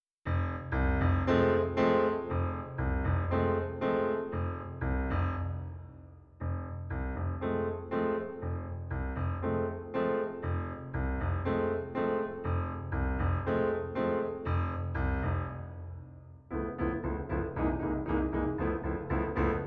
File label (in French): funky time accompagnement